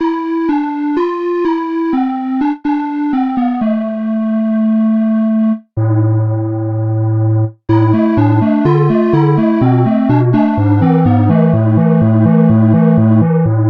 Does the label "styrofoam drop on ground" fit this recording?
No